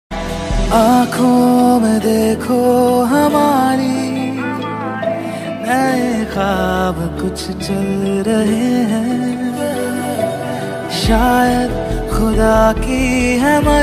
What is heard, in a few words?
bollywood ringtone